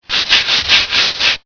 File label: scrub; Collection Synesthésie; Isabelle Grosse